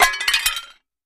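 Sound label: Tile Large Square Break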